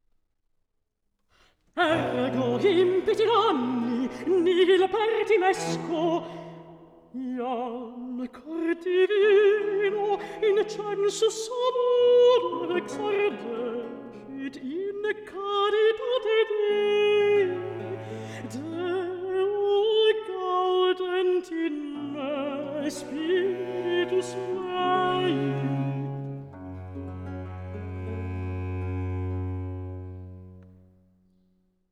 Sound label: Mottetto for Contrallto and Strings, Recitativ; Antonio Vivaldi (1678 - 1741); Mottetto 'Vos invito, barbarae faces' RV 811